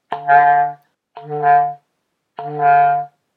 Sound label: slap spectral multiphonics